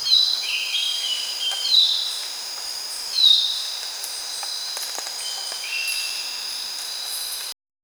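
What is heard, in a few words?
tropical rainforest morning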